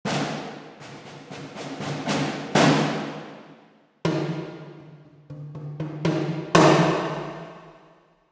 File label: Orchestra High Percussion; Spitfire Audio Abbey Road; Vergleich Percussion Demo Abbey Road One